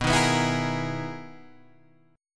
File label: B Major 13th B, Guitar, Standard; strummed; capo=6